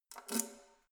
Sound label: letterbox metal key pull out